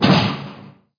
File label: thud; heretic ii demo; doors